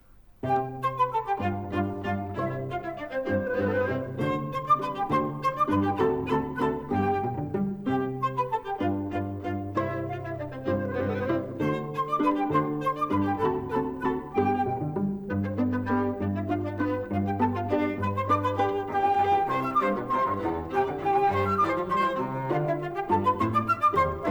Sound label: Mozart Flute Quartet No3 k285b; Pasquier Trio